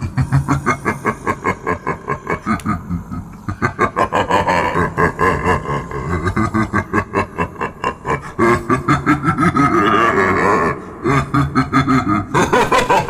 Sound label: Grumble